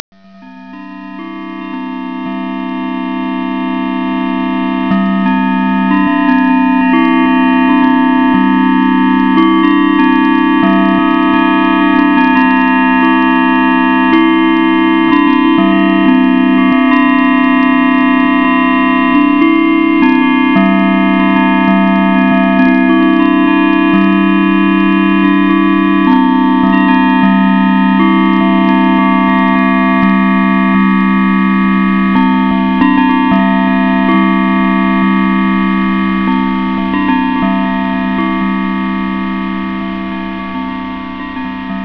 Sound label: chimes; MUSICAL